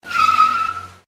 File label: steer, Karting